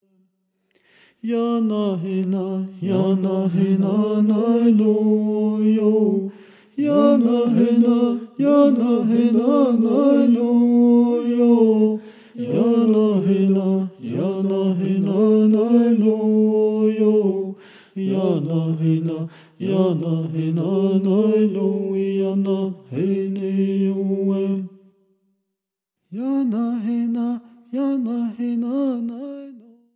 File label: Vocal Track